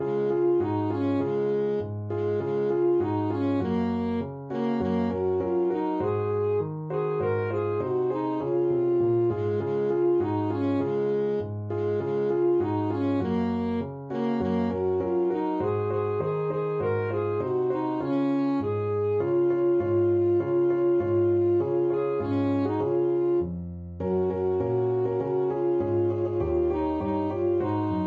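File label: alto saxophone; jingle bells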